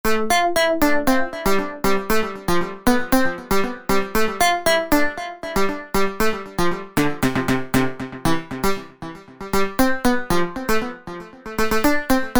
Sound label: SYNTH TEXTURES - Sync; Solomon Sync; Apple GarageBand 2